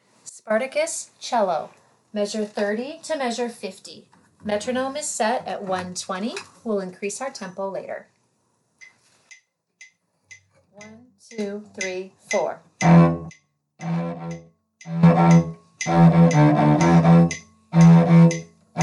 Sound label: cello; spartacus